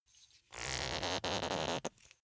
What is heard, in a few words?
glass bottle close aluminium lid tight long